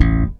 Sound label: bass 3 (A) pluck; Sonic Foundry (Sony Creative Software); Ugly Remnants VOL-2; Basstuff